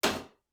Metal Footfall; soundscrate

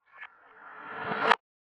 Reverse Spell; SoundsCrate

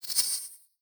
Stuttering Magic Movement Effect; SoundsCrate

